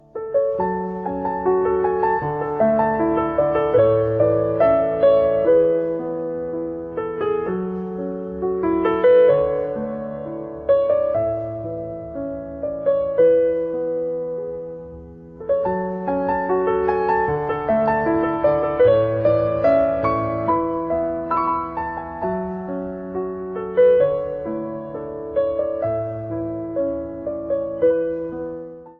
PIANO

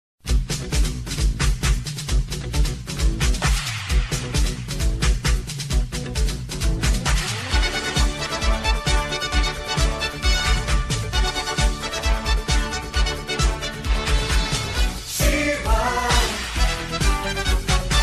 instrumental; She-ra

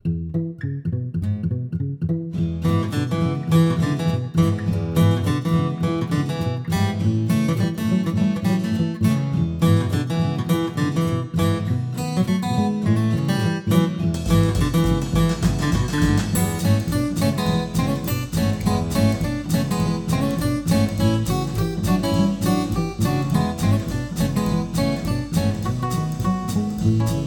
Blusy

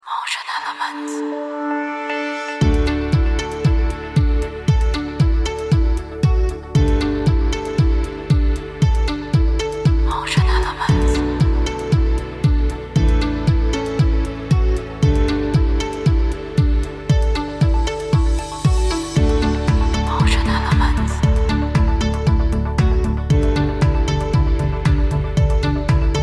innovation; MotionElements

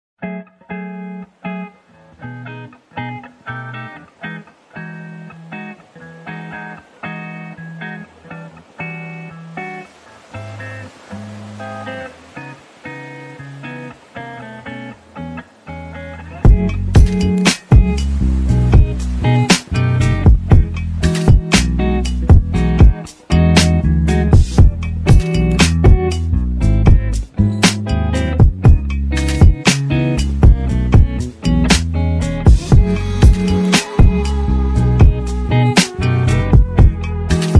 Lofi

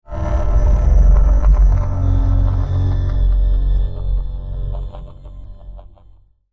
sci fi circuits hum; sound effect designed

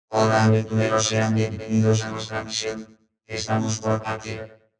robot

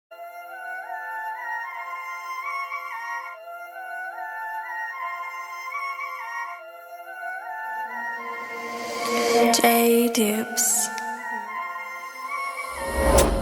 instrumental

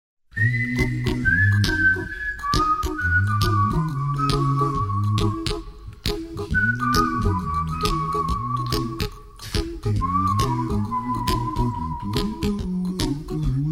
Ringtone

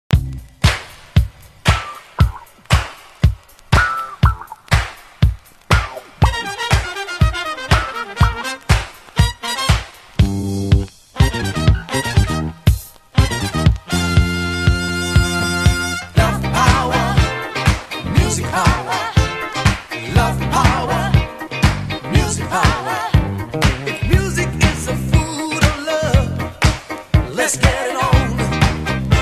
Funk, RARE FUNK

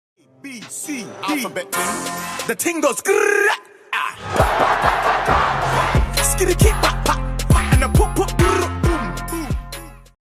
follow sound alert sound and donation sound; twitch

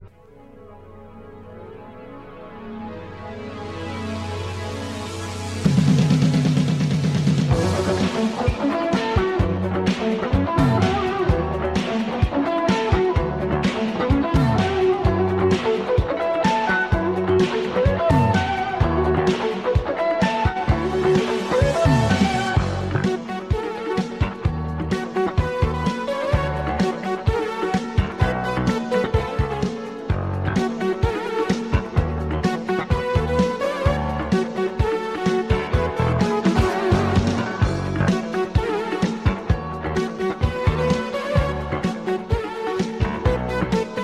funky cosmic groove

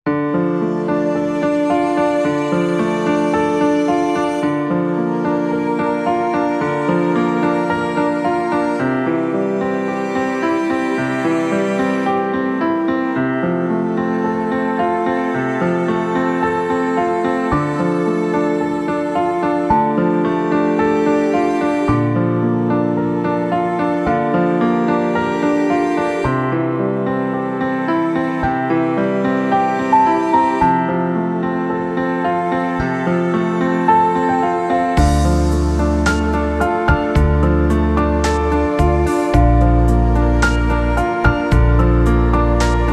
musica dormir calm relaxing

